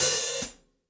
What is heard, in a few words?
Gretsch Hi-Hat Closed